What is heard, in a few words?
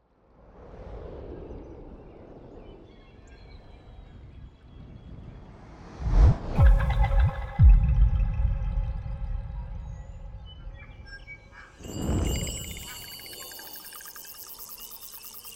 3D nature; طبیعت سه بعدی